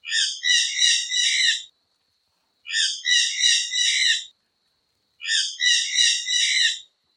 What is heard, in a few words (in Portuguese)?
Canto da Jandaia Sol